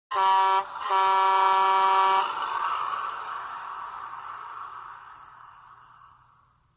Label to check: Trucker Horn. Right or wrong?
Right